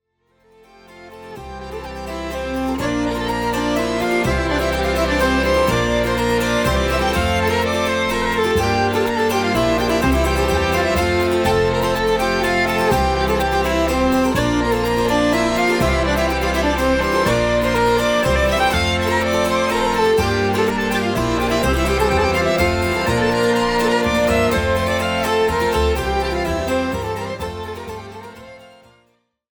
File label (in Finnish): polska; puutarhautuminen; etnonet